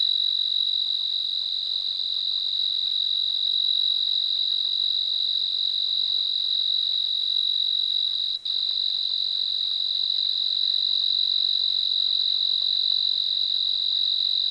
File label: Oecanthus pictus, 24degree Celsius